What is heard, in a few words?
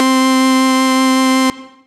Synth Keys; Purple FarFeeza